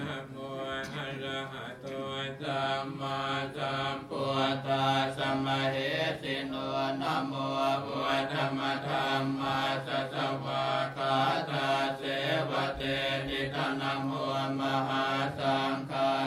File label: Thai chanting